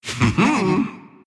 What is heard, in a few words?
laugh; Orac